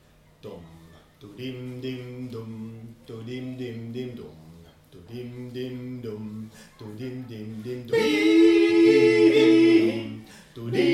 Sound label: pow wow